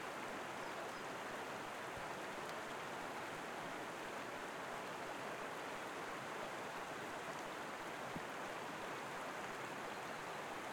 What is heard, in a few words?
River close shot, nature, birds, no people, Italian ambience; Federica Vairani